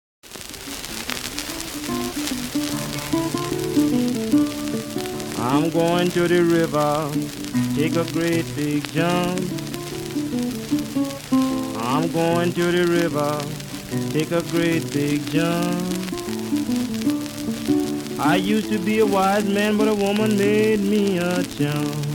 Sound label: Chump Man Blues, blues guitar; blues guitar artist books